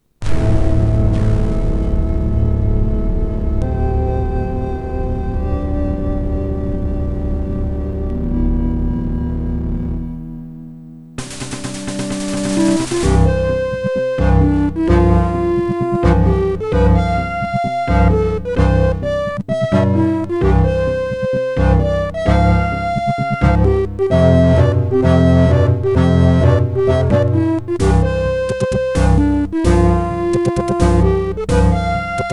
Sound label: SB Terratec Base1 Speaker Out; Terratec Promedia Base 1; 486DX-33, Sound Blaster; 44 kHz stereo FLAC recording; Indiana Jones & The Fate of Atlantis LucasArts (1992)